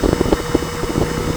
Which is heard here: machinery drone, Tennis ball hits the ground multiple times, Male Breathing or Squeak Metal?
machinery drone